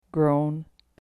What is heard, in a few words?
groan